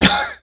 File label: Hawk, Adult Male